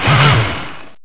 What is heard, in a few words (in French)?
Accélère; AUTO